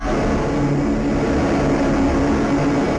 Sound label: fami transform; hero-mark-2